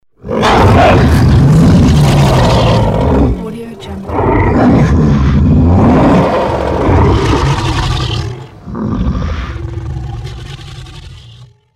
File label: big dinosaur roar